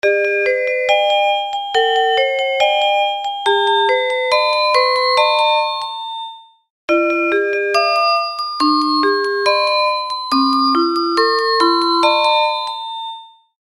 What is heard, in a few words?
Melody; Endica VII The Dream King